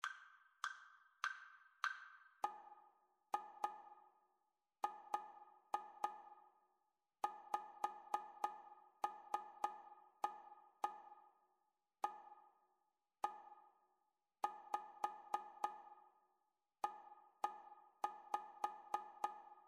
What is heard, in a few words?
rhythmic dictation; ear training